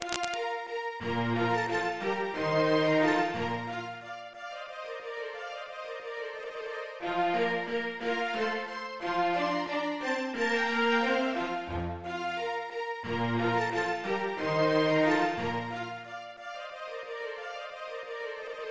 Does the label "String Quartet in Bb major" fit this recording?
Yes